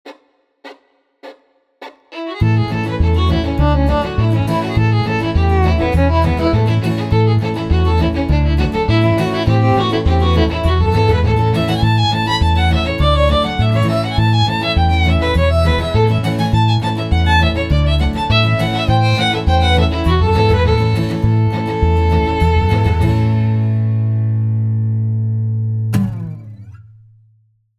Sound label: The Fiddler Traditional; Harmonic Subtones